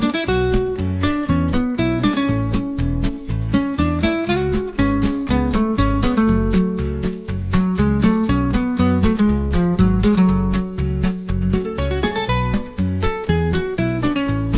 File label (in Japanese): アコースティックギター コミカル